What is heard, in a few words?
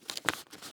Package Open; this is the zodiac speaking 2020 gamerip switch ps4 xbox one pc macos